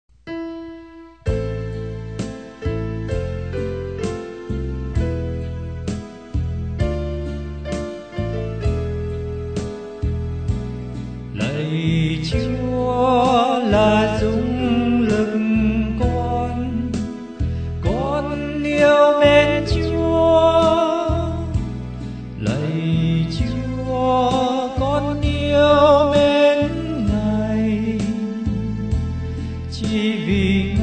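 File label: soprano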